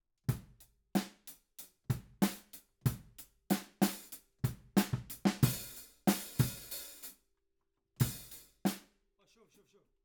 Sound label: Ride